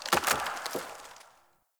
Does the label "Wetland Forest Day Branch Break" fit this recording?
Yes